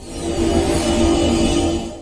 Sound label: City Complete; the great ottomans strategy battle for throne android